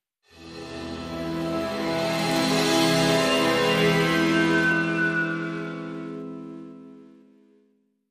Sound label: Intro FX Sting